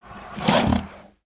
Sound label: Pet Croc Alert; creatures pets; Lego